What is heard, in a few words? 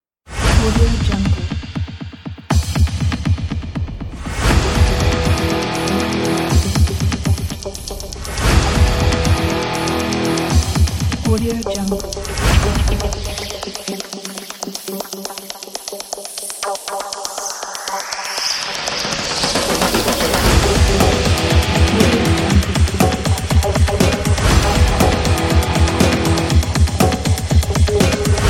Hybrid Percussions Cinematic Drums